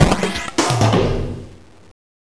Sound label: 120 NEON FX 1, Industrial Loops